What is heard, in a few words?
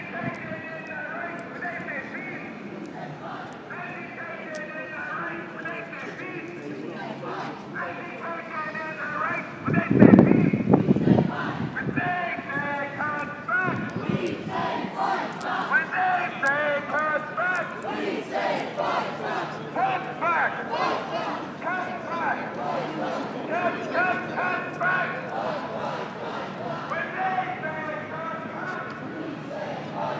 small anti tuition fees demo; in Tavistock Square